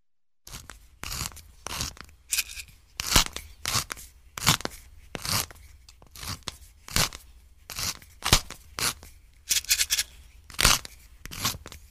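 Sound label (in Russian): звук перечницы перемол перца встряхивание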